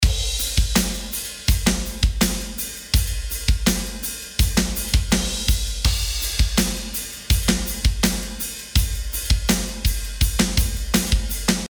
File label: Drum Bus